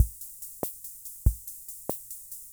Keynote MR-101 Auto Rhythm Loop; Keynote MR-101 Auto Rhythm Sample Pack

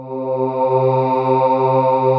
CHORAL PADS; Pro-Rec - Synthsonic; Z1 PADS

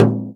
FRAME, INDIAN PERC

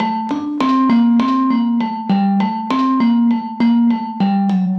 Dirt Vibe, 100bpm; Loops n Lines

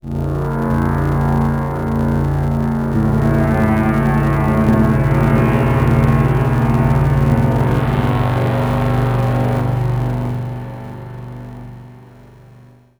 Demons Pad; Sonic Foundry (Sony Creative Software); Loop Noir

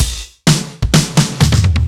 Fills 128BPM, Clubsounds

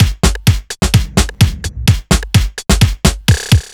128 Full, Drum Loops 128bpm; Zero-G; Soundclash Nu Dance Electro; Acidized Wavs; Jock n Roll

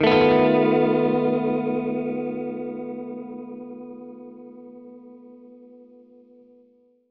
Gretsch Chord F7b5, Guitar Chords; undercover samples

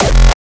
HARDCORE FRENCHCORE UPTEMPO, kick

